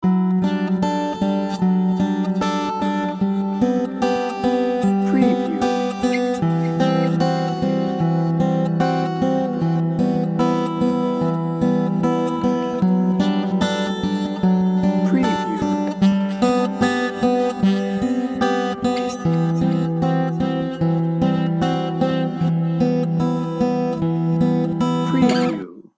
dreamy serene guitar loop

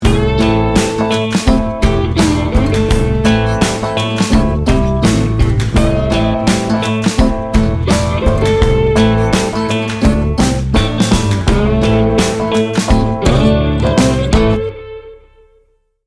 gator hunt

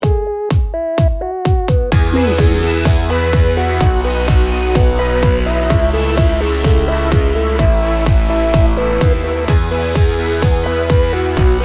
joyful edm

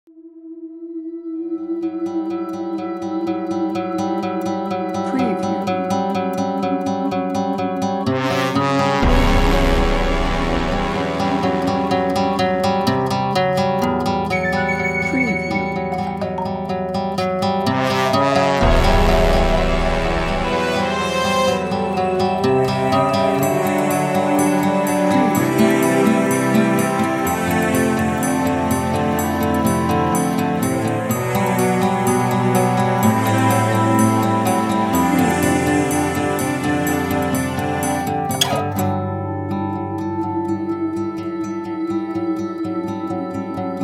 heroic drama action no lead guitar

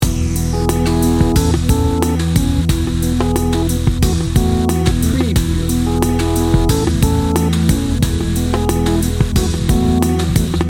comfort zone loop

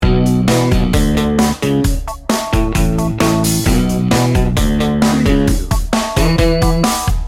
groove thang loop

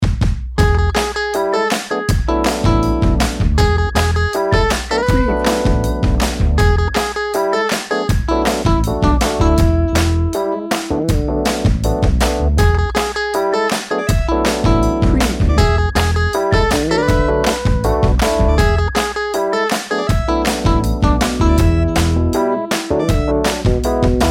jazzy feet

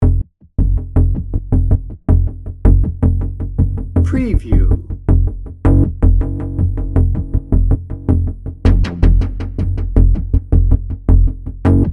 pulsing drone

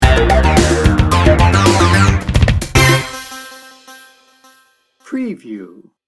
funk feel good sting bumper

